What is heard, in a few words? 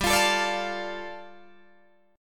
G 7th Suspended 2nd, Guitar DADGAD